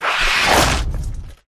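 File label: Rat attack up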